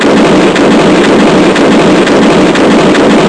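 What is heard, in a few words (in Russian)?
fire noisy close sharp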